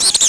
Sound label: Laughter of Insanity; SNES; Spider-Man